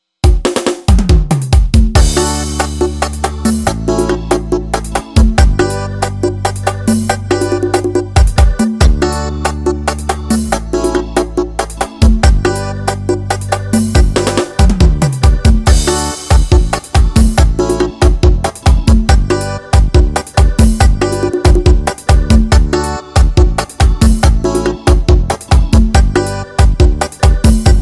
arrocha

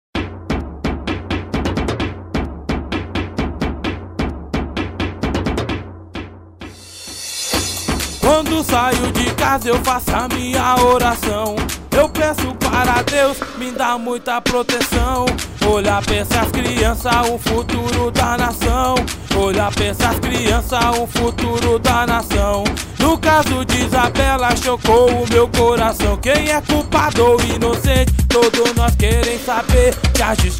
funk; isabella nardoni

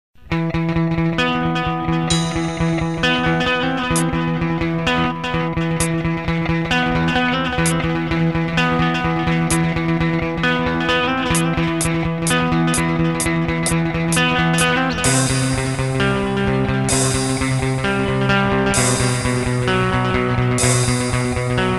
sem vocal

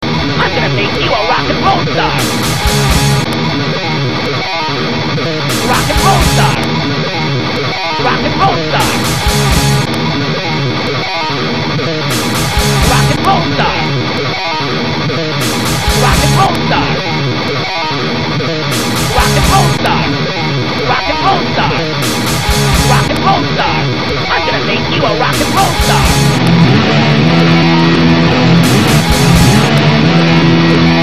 metal basico

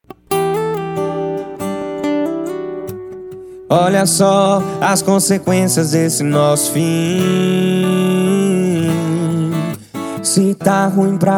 sertanejo universitario sofrencia

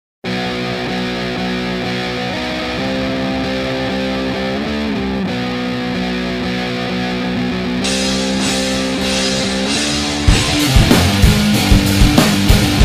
contestando instrumental

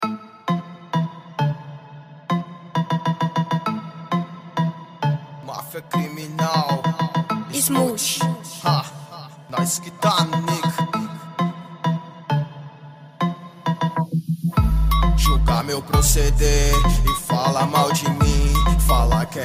rap funk trap music

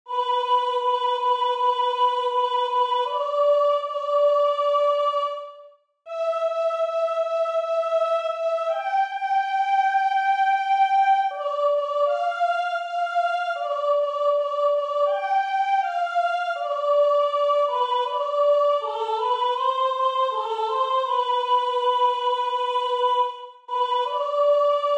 tenor

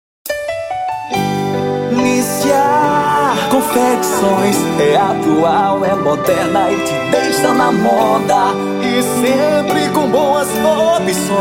jingle confecções; nicia confeccoes; kazamstudio

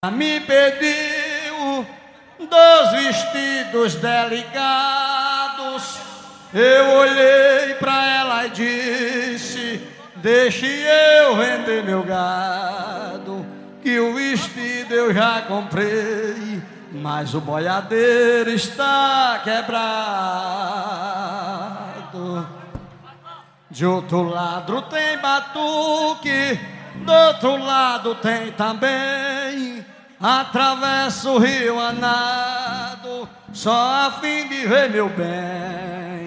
forro toada mineirinha; forro bate esteira

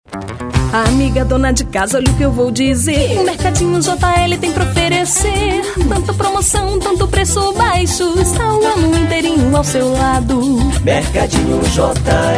jingle mercadinho

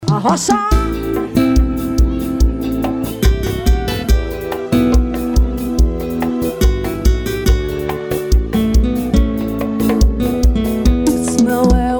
forro ao vivo

